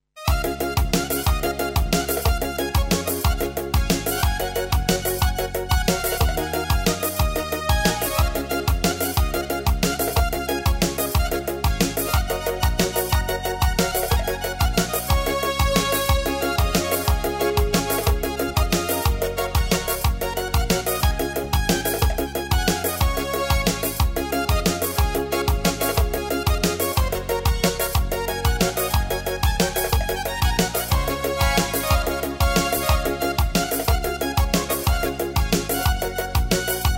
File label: Armenian Style New Year